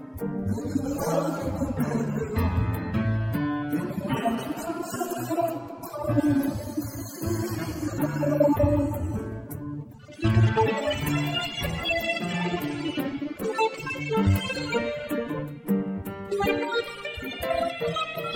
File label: Brushes Percu Piano; ICASSP11; DUET